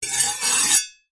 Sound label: knifes medium scrape blades together